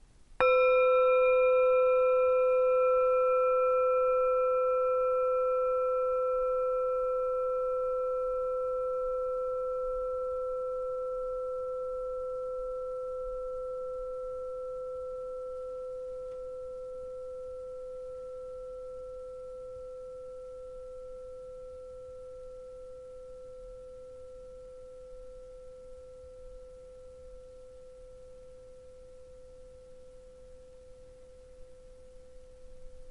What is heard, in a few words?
Bowl